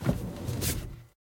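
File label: light even paper rustle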